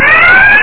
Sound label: red alert; Cool Address 1.5